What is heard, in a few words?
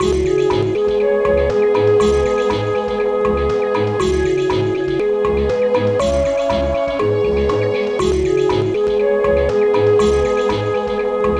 Music Forest; Zarah Gwoor's Castle; NDS